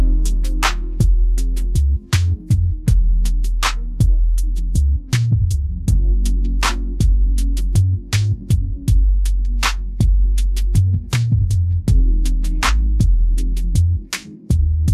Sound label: midnight soul groove, ringtones